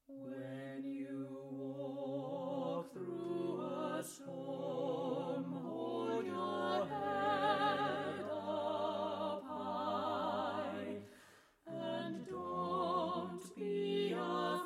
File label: voice